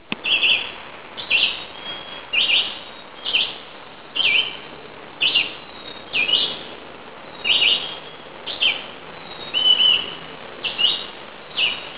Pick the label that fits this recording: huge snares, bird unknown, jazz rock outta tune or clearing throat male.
bird unknown